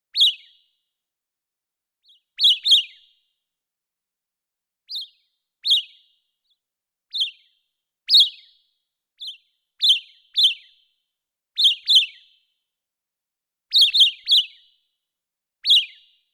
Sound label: small birds